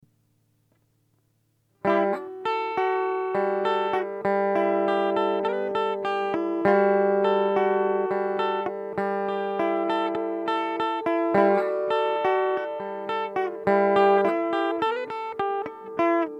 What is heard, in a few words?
lullaby like tune